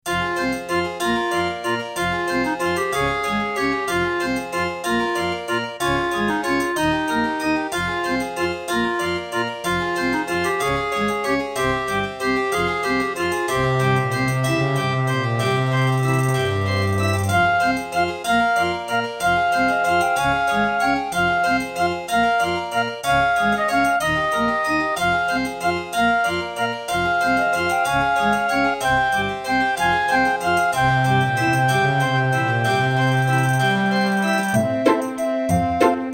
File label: Track 6, Roland SC-33; Death Gate